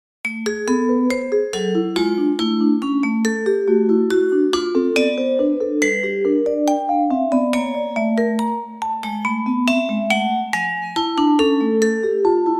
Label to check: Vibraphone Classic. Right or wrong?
Right